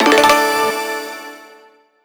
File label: roulette result